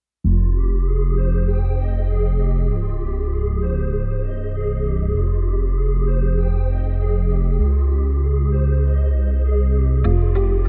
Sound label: RAP